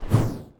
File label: Torch Swing